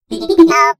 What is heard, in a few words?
bb8 giggle